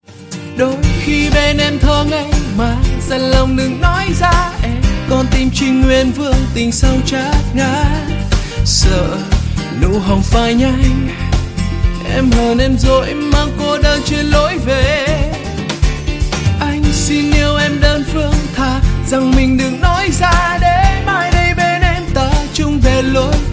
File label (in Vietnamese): nhạc chuông acoustic